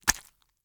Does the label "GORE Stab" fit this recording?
Yes